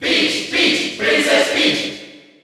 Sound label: Cheer Dutch; Peach; SSBU